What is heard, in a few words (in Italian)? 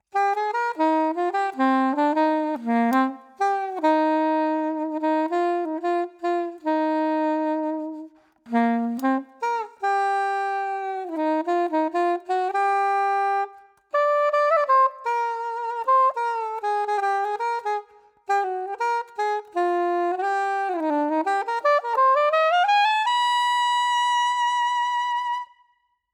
SOPRANO CURVO; timbri